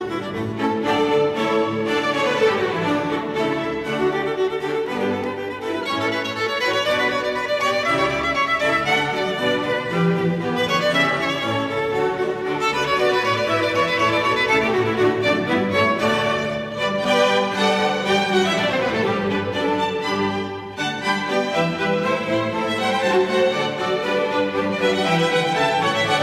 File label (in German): konzert fuer viola und streichorchester presto; g dur